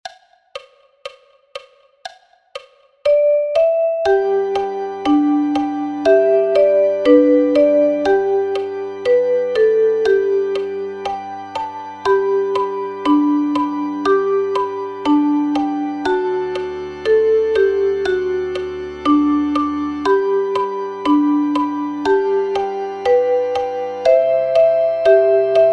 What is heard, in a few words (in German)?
Shell be coming round the mountain, Duette für zwei chromatische Mundharmonikas; American folk songs